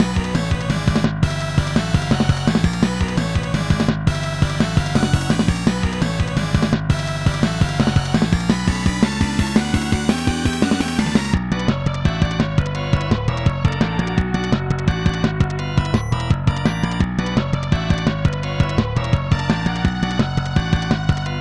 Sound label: Battle; Nage Libre 1995 snes